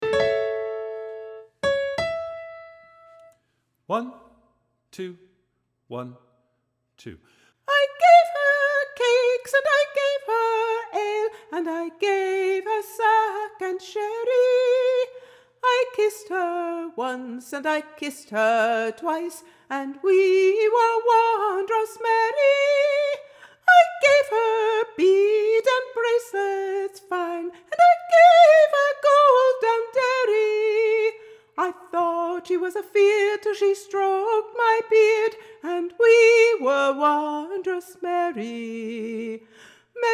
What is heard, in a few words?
1 Voice; I Gave Her Cakes